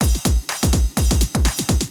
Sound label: drum loop house 125; eJay